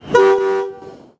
Train Horn Passenger 2 Toots Electric Multiple Unit